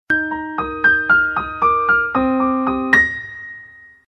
Cute Alert

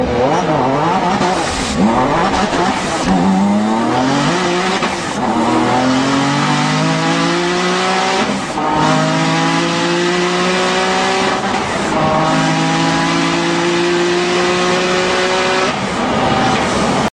9ff Carrera Gt

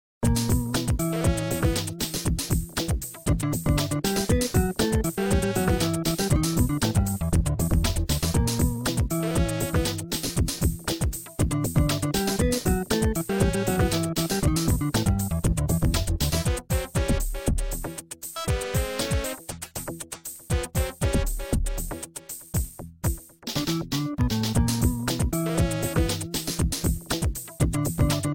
Beat

